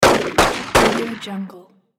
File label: gunshots